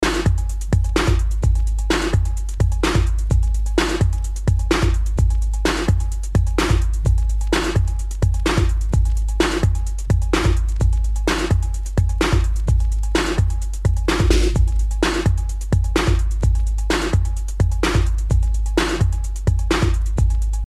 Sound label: Break